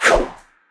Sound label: Whoosh